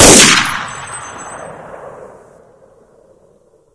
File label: k43 shoot, weapons; source engine; dod